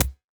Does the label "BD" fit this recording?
Yes